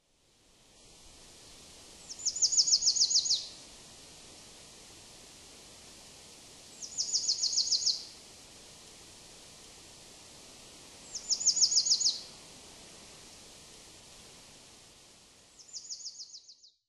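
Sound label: marsh tit, nopporo; koe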